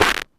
Percussive Hit, contact mic

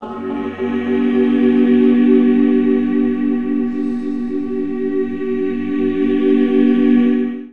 VOX, Underground Hip Hop; USB Soundscan vol.02; AKAI

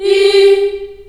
G3, CHILD, Choir; CHILD IHS

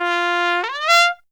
TRUMPET FX; Zero-G; Phantom Horns

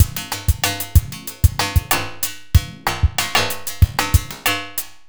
Drum Loop, Drum Loops

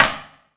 Percussion FX, Drum Machines

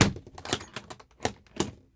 SUITCASE, Tools; AKAI S6000 CD-ROM - Volume 6

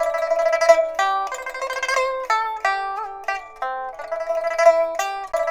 PIPA, 90; INIS - Opium

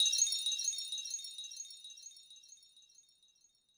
Korg 01W Percussion

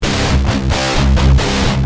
Guitar Heavy, Industrial

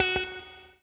ROCK, Guitar

